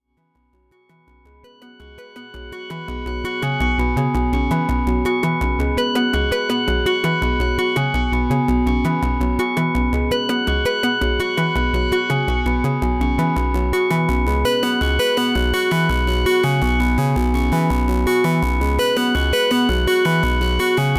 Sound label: modular